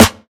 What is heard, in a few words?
perfect steel snare drum f key, Royality free snare drum sound tuned to the F note.; snare drum samples